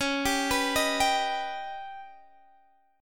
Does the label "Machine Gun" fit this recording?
No